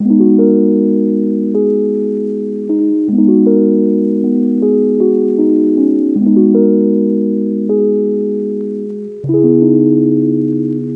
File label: 78 BPM G# Min E Piano; Lofi; Melodies; Loop Stems; Cymatics; Lofi Starter Pack; Winter Bliss